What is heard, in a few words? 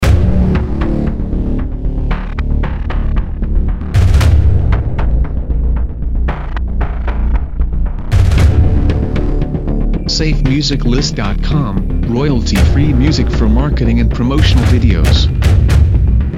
Rise Of The Machines